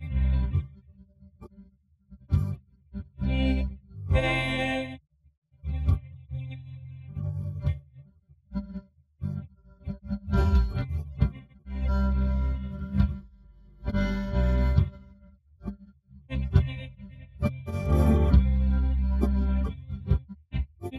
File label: piano; SiSEC11